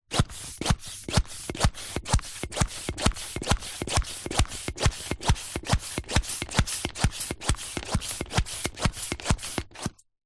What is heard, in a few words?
household pump hand small plastic pumping lever perspective; zapsplat